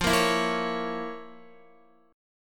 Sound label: F Major 7th Suspended 4th Sharp 5th F, Guitar